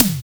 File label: SIN TOM; Drums In Your FACE!